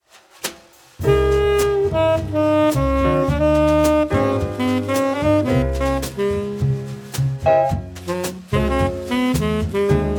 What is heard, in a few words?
Coffee House Jazz